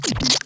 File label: down test